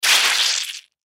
Squish Splat Large, Foley Squish Splat Large Juicy; Squishes; Objects; Blastwave